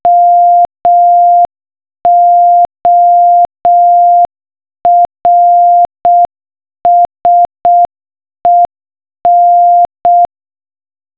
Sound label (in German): morsecode knacken